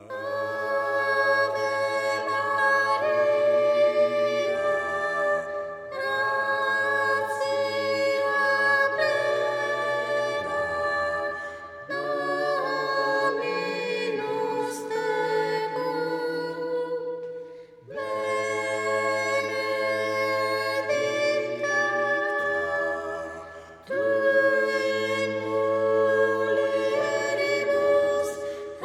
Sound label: ave maria gratia plena soprano